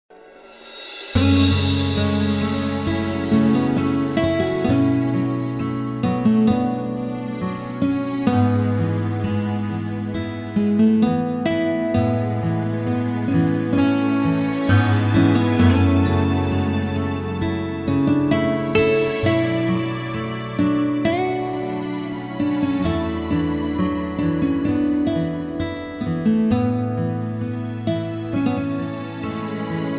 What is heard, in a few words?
Guitar Instrumental